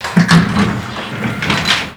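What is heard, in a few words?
elevator door open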